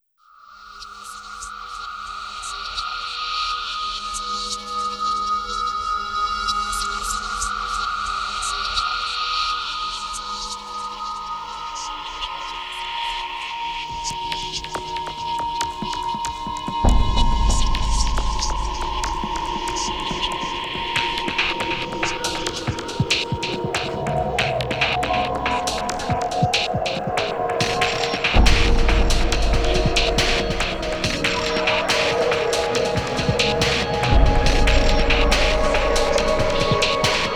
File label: Tech Trance